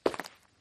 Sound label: build Touch